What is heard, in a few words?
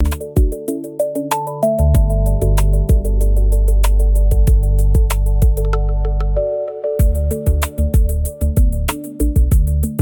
loop; education